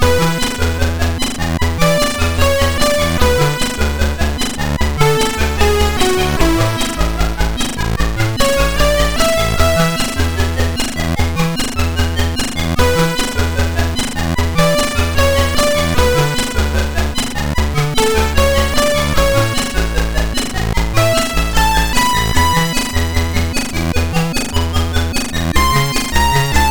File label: Simple Demo sid MOS6581R4; RSS